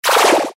Flash Animation Multimedia; Web Interactive; Button Sound Effects; Blastwave; Technology

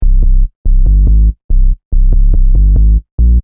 Techno Trance Sub Bass Loop In C 142 Bpm; Bass Loops; Royalty Free Bass Loops

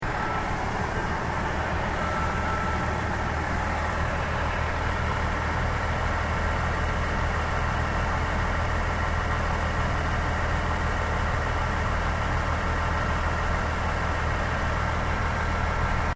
Truck Large Diesal Idle Loop

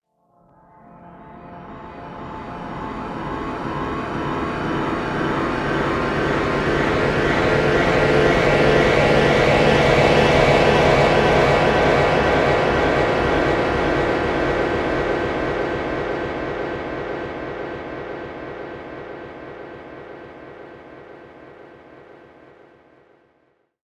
Atmospheric Tension, horror soundscape; Scary Soundscape Sound Effects

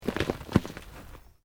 Earth Loose Move Short

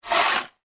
Industry Shovel Scoop Scrape Cement Single; Hand Tools

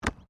RUGBY BALL HANDLE

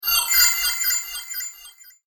Spell Of Enchantment, magic sound effect; fantasy sound effect; Andrew Fleming

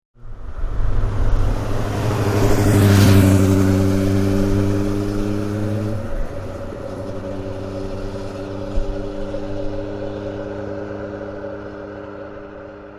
Single passing car on quiet road.; Cars (Sound Effects:Vehicles)

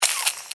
Device Switch, Scifi; Science, Fiction; Tech Sound Effects

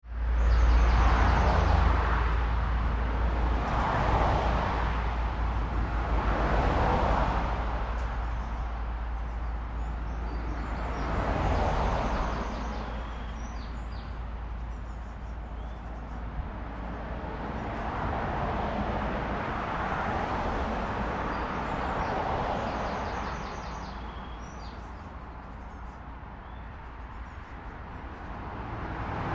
Traffic Constant Passing in Both Directions; Traffic And Car

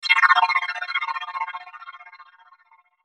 Sweeper Flange Chopper; Production Element Imaging Element Accent Transition; Sweeper Sound Effects

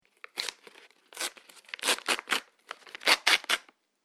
Ripping Paper Bag; Ripping Sound Effects; Foley Sound Effect

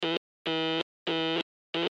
Morse Code P Letter; Blastwave

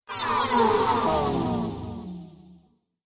Alien Engine Rev; Alien Sound Effects; Science Fiction Sound Effect; Andrew Fleming

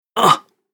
Human Fx Grunt; Grunt Sound Effects; Human Fx - Grunt 3

